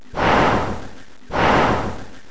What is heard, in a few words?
dragon puff